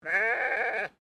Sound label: Animal Sheep Unknown Baa; Sheep at Collingwood Children's Farm; Sheep (Sound Effects:Animals)